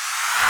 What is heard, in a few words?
noise fx; Masterbits; Switch FX